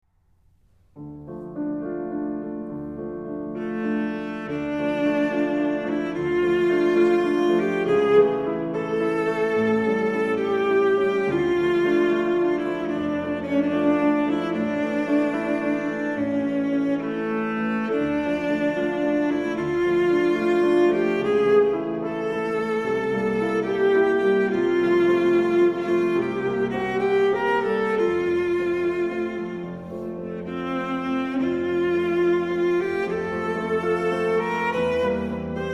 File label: melody